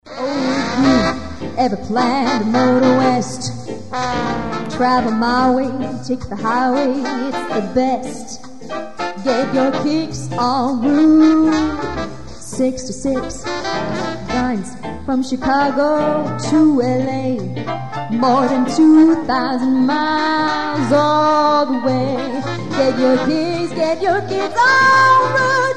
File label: Swing